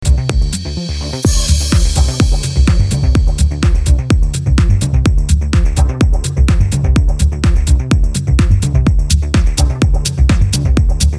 Electric Waves Dub mix, Progressive-House Tech-House